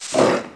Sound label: phantom wolf attack